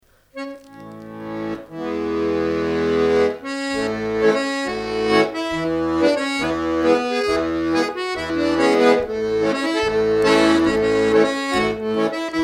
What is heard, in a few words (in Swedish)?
schottis; efter fremat oskar